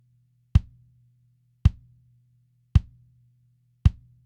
grosse caisse avant egalisation

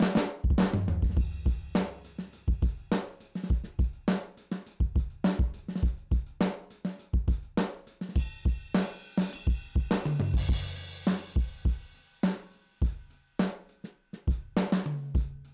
batterie avec heavy; flywheel

